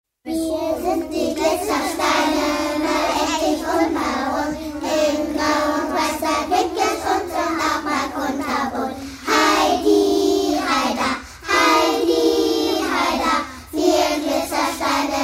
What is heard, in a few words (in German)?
Glitzersteine Lied